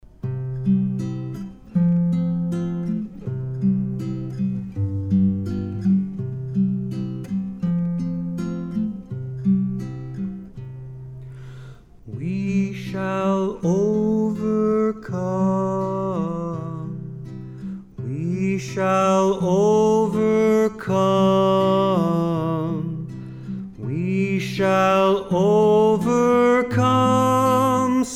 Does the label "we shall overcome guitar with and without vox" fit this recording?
Yes